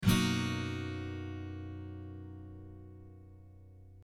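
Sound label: BMaj7, guitar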